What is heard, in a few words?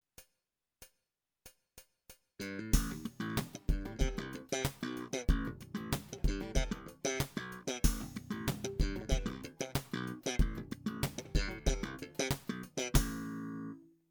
Slap, bassoelettrico